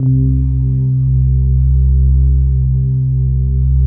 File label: PAD, DIGI-PADS